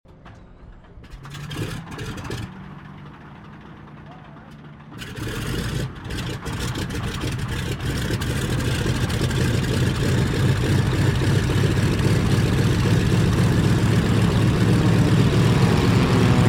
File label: aircraft douglas dc3 startup